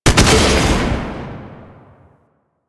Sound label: Sniper Alt Fire Shot; DE; Warframe; Frumentarius; Exalted Sniper